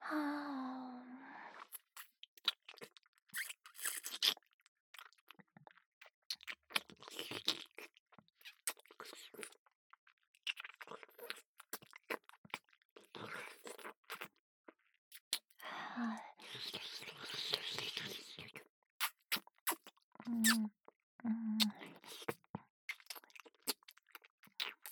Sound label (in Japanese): 乳首舐め, ASMR; アドリブ集